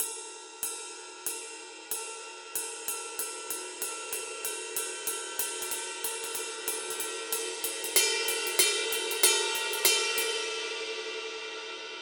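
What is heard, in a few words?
22 heavy ride pattern, cymbal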